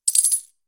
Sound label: unbox